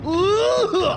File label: Uuuuuuuuuuuhuu